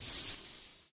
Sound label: steam; hedgewars